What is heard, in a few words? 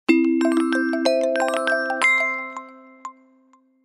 alarm rolling awake; смартфона TCL; Мелодии звонка, будильника и сигналы уведомлений смартфона TCL